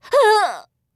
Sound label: DIE; Eft; Christmas Melee